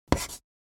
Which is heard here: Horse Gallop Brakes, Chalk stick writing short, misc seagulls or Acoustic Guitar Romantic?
Chalk stick writing short